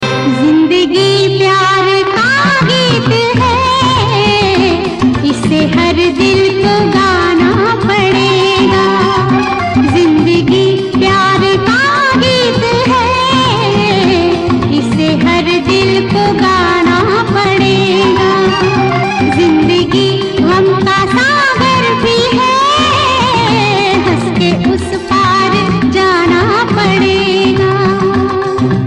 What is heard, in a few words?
Old Hindi Ringtone